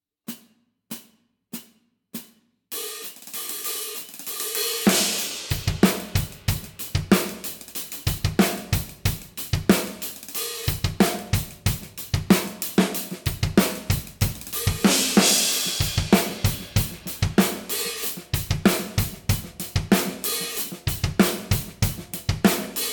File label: 16 Traditional Medium HH